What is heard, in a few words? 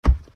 punch